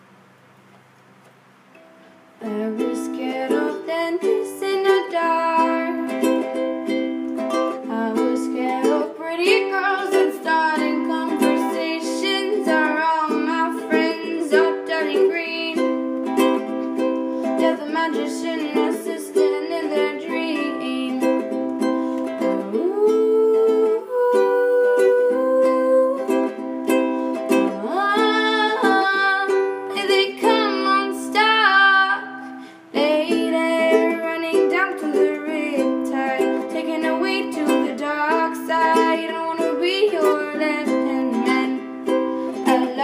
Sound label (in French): chant